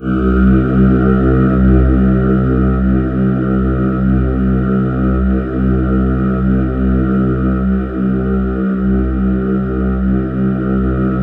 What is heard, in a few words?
C2, Synth Leads